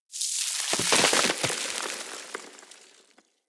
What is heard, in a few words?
Grenade Debris Snow; Venus; Venus Space Man; Venus Grenades; Venus Grenade Debris Layers